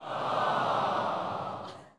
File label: snowman crowd salah; MiniGames